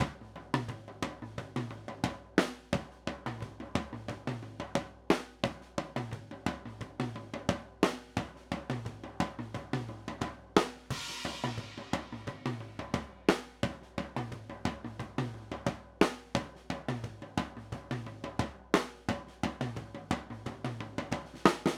OHs intro

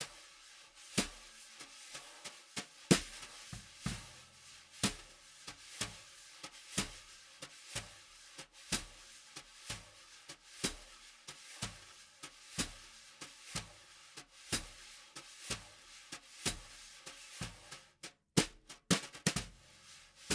EQ Snare; TubeTech

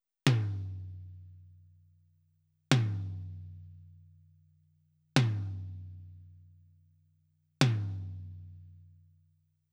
Daking Tom 1 Fet II Fairchild

